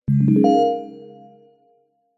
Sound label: multimedia notification digital bell warm generic